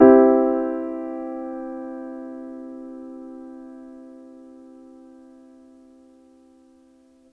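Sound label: Piano Grand major soft; TrashLibrary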